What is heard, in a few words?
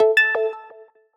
Jump; Lenovo; ZUI 2